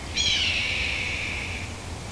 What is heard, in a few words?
red tailed hawk